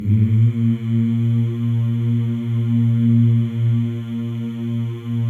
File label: male; Spectrasonics; Symphony of Voices